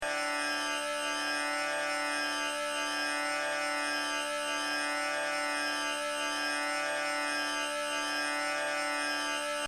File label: D Tampura